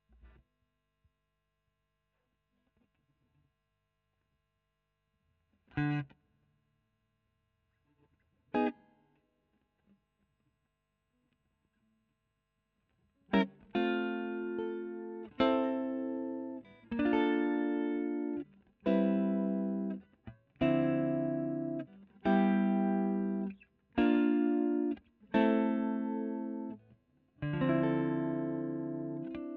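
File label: electric guitar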